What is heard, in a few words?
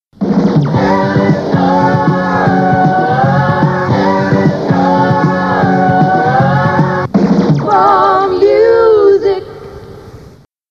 Jingles